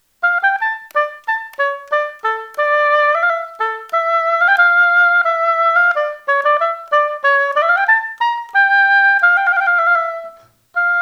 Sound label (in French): hautbois